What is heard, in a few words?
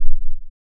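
ring modulation sin sin, env silence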